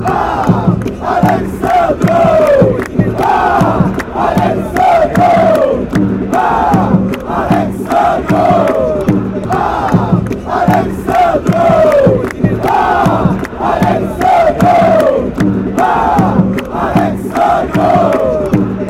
vasco da gama chant, fanchants

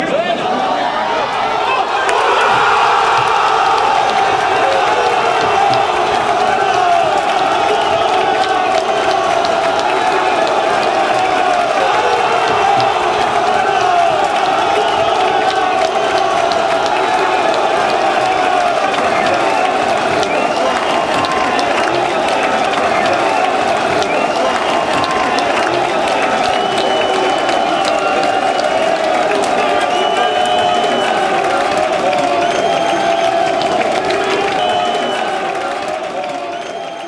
gol, fanchants

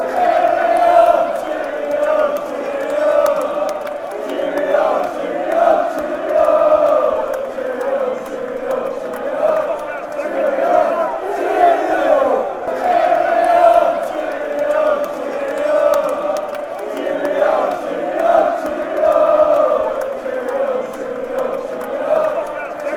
cheerio, fanchants; ncfc

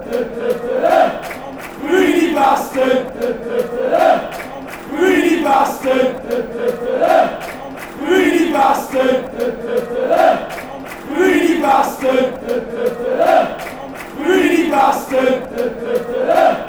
greedy, fanchants; dcfc

